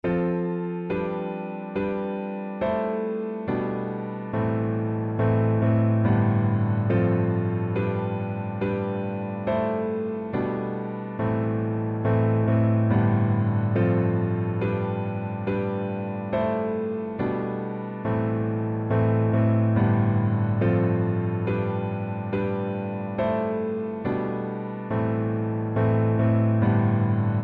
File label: piano